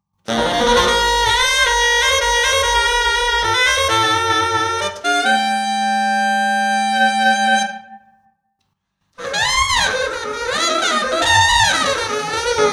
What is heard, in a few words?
sample1, sax